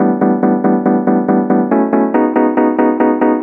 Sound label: Piano Rhodes